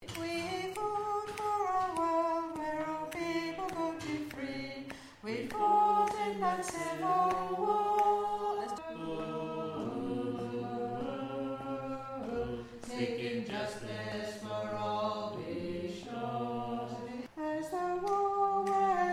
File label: Bass